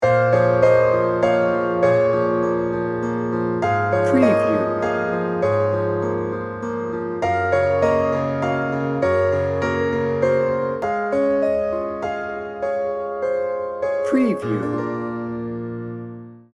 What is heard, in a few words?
cinematic romance